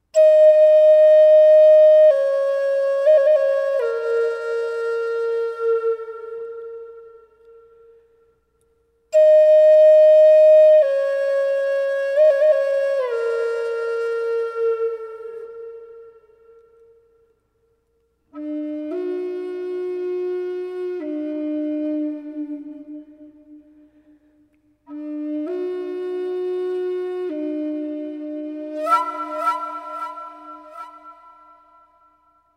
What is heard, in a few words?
flute